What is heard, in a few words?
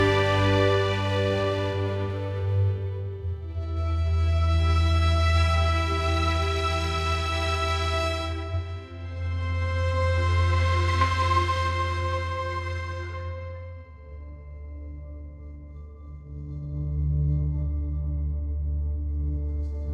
ringtone cinematic